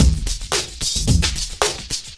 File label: Breakbeats loop, 70_s Breakbeats